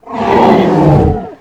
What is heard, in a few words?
Pinky Death, monsters vocalizations; DoomRTX